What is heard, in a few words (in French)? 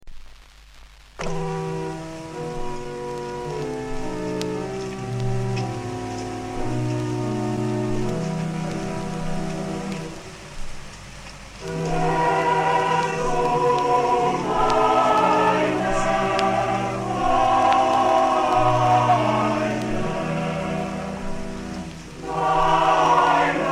Chorale